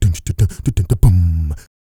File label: LISBON, Vocal Planet, Blues Soul; Spectrasonics